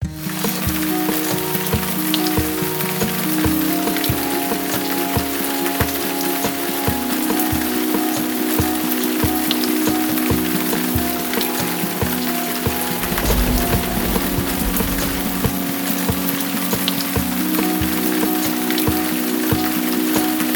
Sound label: rain